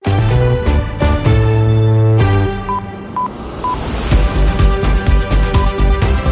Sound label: News On Logo Only Alt